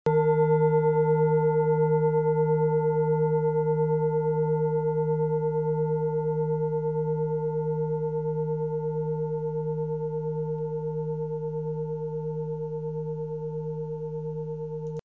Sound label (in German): assam klangschale